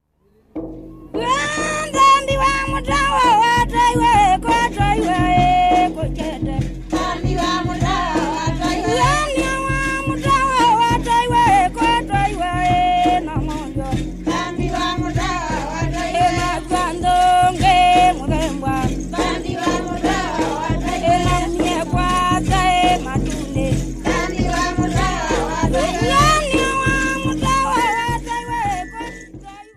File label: Kamba People